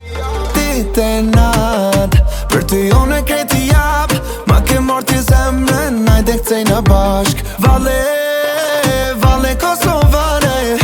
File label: German ringtone